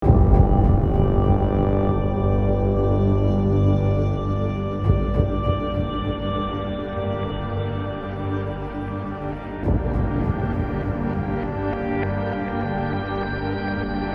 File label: Pause Ambience; Deus Ex Human Revolution